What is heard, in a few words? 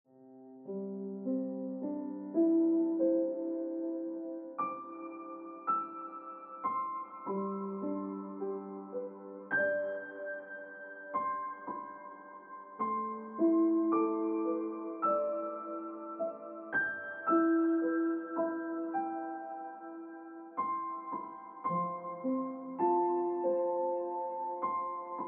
Ringtone Piano Music